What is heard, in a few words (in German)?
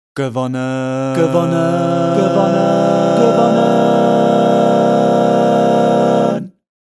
gewonnen chor